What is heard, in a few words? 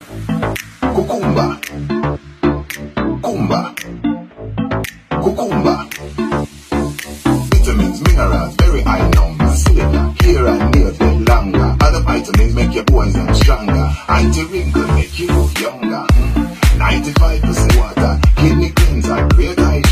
remix